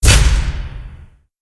lights end; breakdown

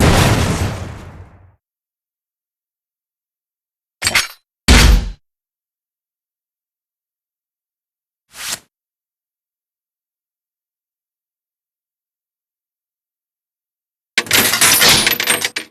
transform; pierce

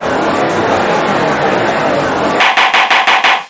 gallery rapid gavel

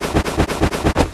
shake arms; ahlbi

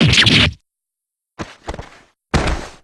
fall

